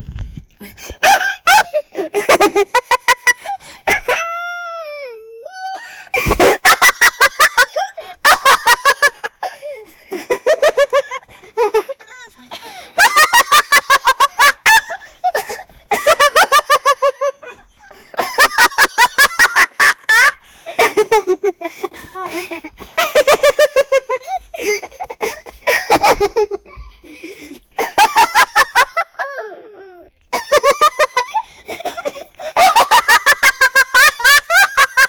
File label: a 20 year old, laughter